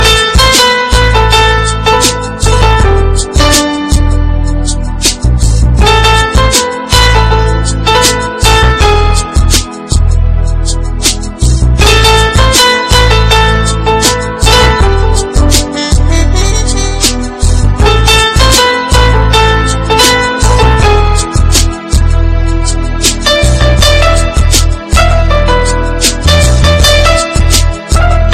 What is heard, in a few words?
Romantic Tone; Beauty